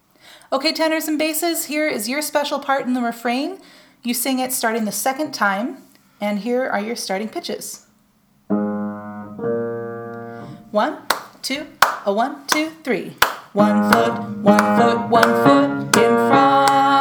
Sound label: TENOR-BASS Teaching Track; acts thanksgiving practice tracks